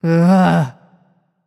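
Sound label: ugh, female voice; smite; voicepack; hera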